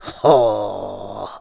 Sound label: Ohh; Impa: "Ohh..."; zelda